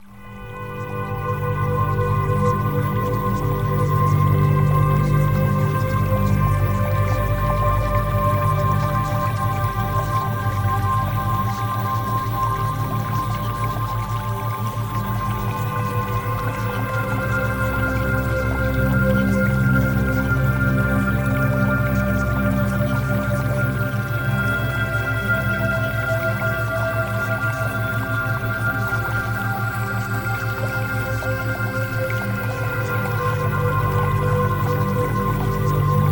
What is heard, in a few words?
Music Theta Waves